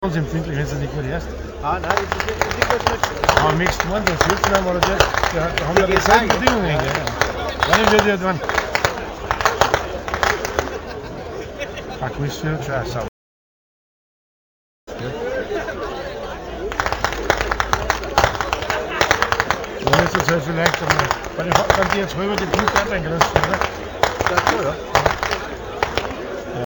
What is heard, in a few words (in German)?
schnalzen